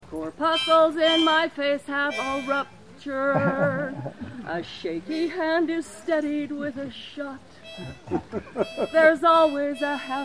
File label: Drinking Song